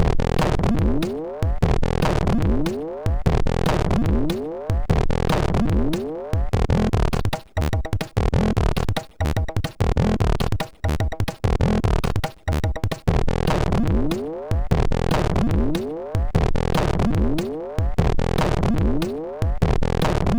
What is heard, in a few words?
Bouncy Funk sid MOS6581R3